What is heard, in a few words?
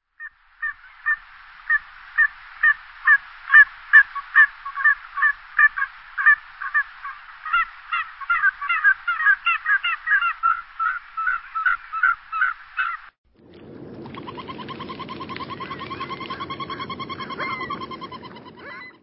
Surf Scoter; Birds